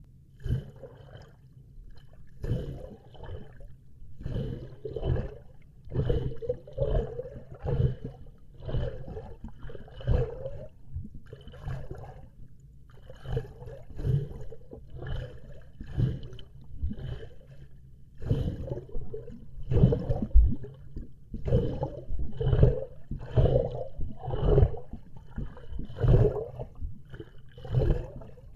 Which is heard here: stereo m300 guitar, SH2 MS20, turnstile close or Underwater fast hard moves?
Underwater fast hard moves